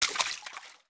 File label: FS Water, footsteps